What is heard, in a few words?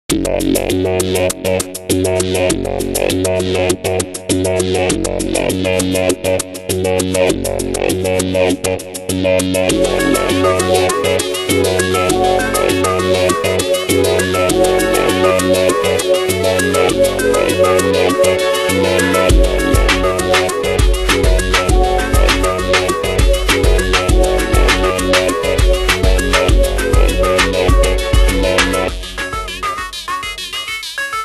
Hemiquaver, ringtones; Microsoft; Windows Mobile; Ring Tone Pack 5